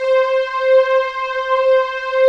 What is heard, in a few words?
ANA STRING, pads; Pro-Rec - Synthsonic